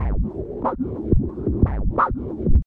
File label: HipHop Backbts; LiveAct Pool